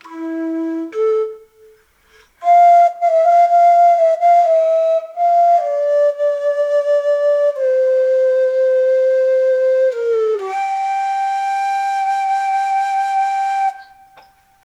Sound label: guqin and flute, asian